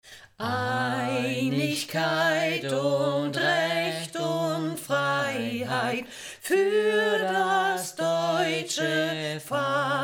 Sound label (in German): Deutschland Hymne Lied